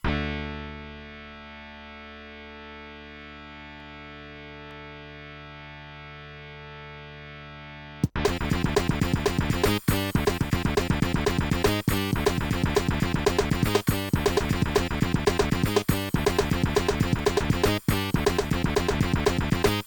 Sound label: nvidia nv1 gm; Nvidia NV1 (GM mode); soundcard test; Doom